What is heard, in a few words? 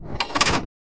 short metallic drawer ope; space station